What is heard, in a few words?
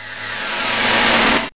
REVERSE; AMG - Vince Clarke Lucky Bastard